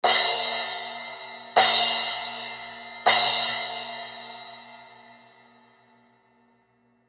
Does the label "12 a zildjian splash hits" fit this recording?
Yes